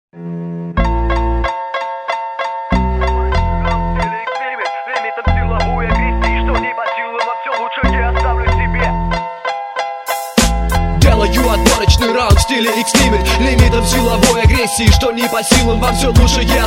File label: street rap battle